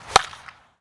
Baseball Bat Crack

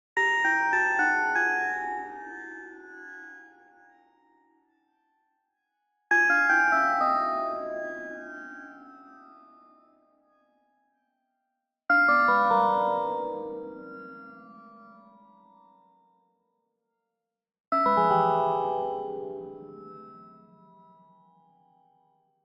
Arcade Jingle Negative

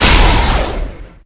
Freeze Gun Shot; Weapons

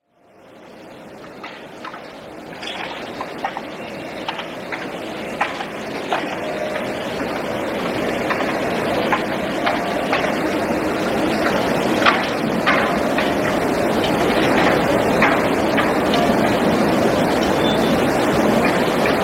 stereo street; Pitch Black - A Detective's Demise

